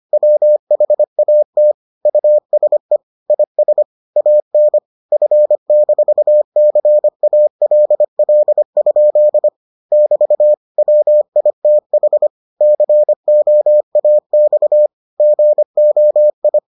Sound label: morse